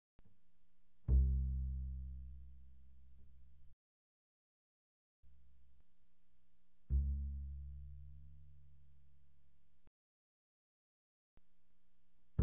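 Bass pizzicato mezzo forte sul A C2 B2, doublebass; Strings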